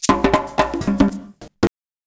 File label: SHAKER, Modern Percussion Loops; Soundscan vol.56; AKAI